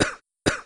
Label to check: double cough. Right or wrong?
Right